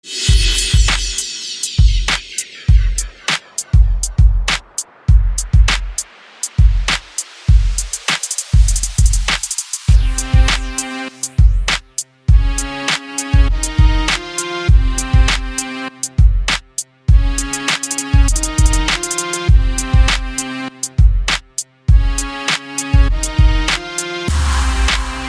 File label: urban flute